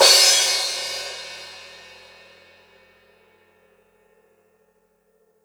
Crash Long; pitchglitch samples; Lightning1024

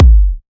heavy; foxdot default

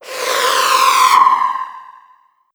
Sound FX Flyby Hi Tech

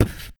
Beat Box Kick

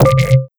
Perc Metallic; Granular Sumo

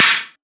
Perc Metal Crash Slap; Agaxly

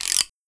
Perc Guiro

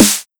Snare Crispy TR909; pitchglitch

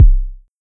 Kick; Rob10; pitchglitch samples

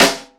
Snare Rock

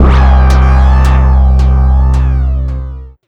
Sound FX Acid Bass; Spankmyfilth

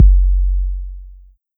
Kick Long

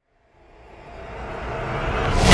Various Riser

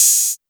HiHat Open TR808

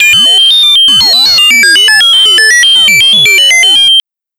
Classic Computing

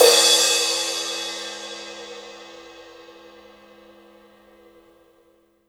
Crash Long; BJAPacific; pitchglitch

